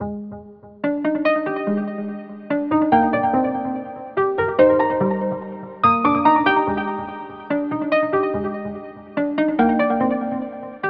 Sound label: 144 BPM C Min Arp; Cymatics; Solar Eclipse; 2022 Melody Collection Platinum Expansion